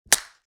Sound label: Foil blister pack pressing tablet or pill out; medicine